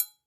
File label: Triangle Mute; Triangle D Mute; Latin Percussion; Drums; Drum Hits; Bell; Ableton; Factory Packs